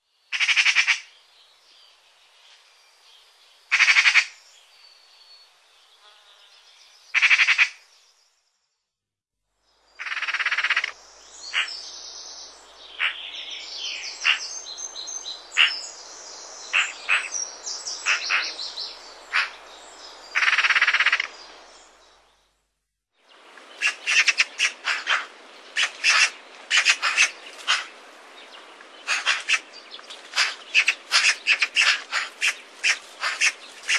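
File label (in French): Pie bavarde, oiseaux; birds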